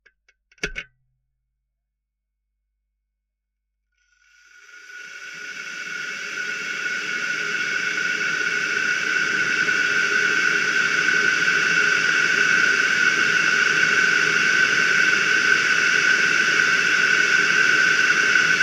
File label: Kettle Boiling Contact Mics; Kitchen